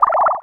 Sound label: Cry; Pokemon Solar Light & Lunar Dark 1.0.1; Cries